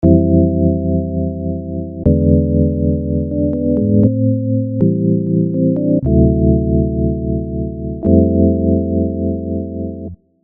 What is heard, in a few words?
trem